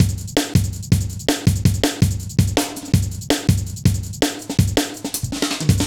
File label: Heavy Live B, Drum Loops, Jungle